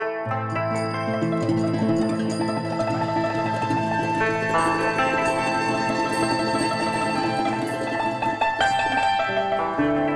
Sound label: TRADITIONAL Chinese, Picking Flowers